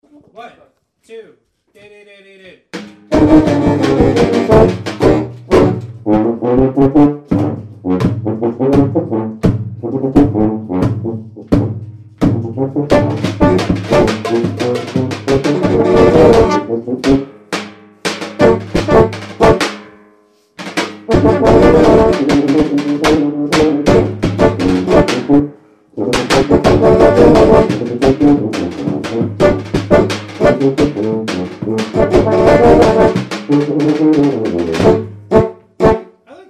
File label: solo rhythm sectional, rehearsal